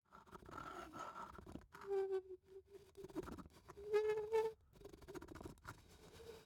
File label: Rusty Creak